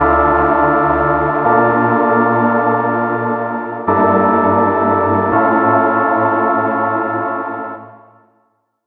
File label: D Minor Prog